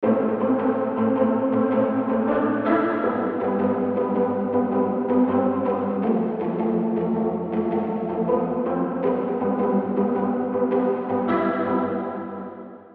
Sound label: Lead; The Birth Of Fire; Routed Sound; Vital Expansion - Flame Summoner Vol.1